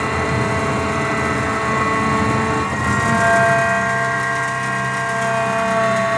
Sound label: turret, tanks; World of Tanks